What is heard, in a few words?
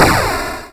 blowup; InteligentnySaper